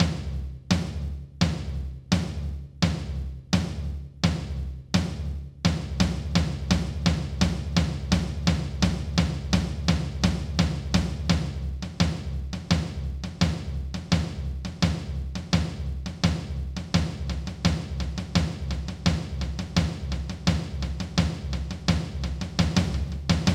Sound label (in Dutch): basis ritmes, 170bpm, taiko